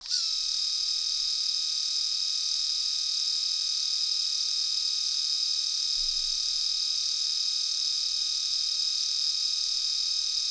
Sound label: philips 9000series sound